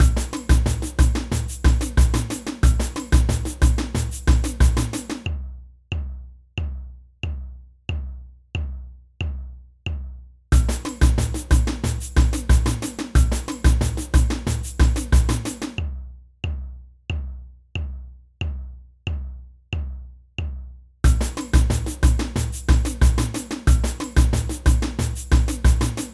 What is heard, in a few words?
surdo, samba